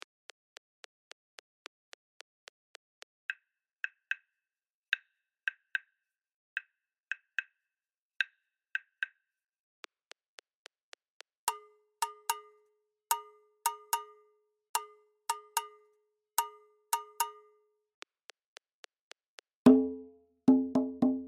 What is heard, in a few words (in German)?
Nago 110bpm, lateinamerkanische rhythmen; Lateinamerika